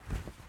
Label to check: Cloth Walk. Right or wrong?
Right